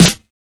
treble heavy snare sample a sharp key

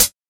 old school original hihat sound a sharp key

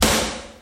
Short Reverb Snare D Key; snare drum samples

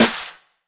focused steel snare drum a sharp key; Duration 0:01

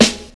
raw hip hop snare drum sound a sharp key

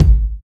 kick sample G sharp key; kick drum samples; one shots

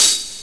muted open hi hat sample a sharp key

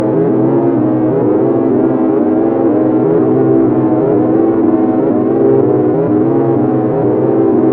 collapsed house siren 124 - Am, Synth; synth loops

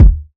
short kick G key; Duration 0:01; Samplerate 44100 Hz; Bitdepth 24; Channels Mono; single-hits-one-shots

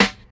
airy sizzle steel snare drum b key

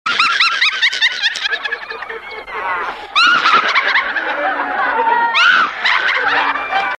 scattered boisterous laughter

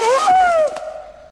surprised scream echo

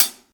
bright closed high hat sound c key; hat samples

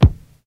old school bass drum c key

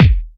focused kickdrum c key

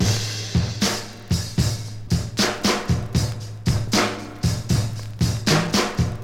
77 bpm modern breakbeat sample A key; drum loop

